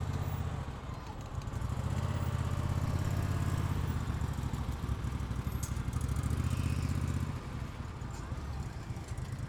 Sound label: cart pulls up